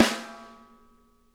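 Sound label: center, snare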